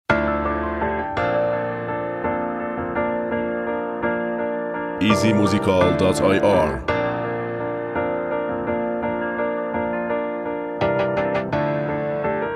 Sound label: Beat